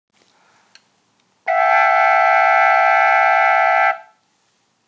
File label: E Fis G A; Multiphonics on two instruments simultaneously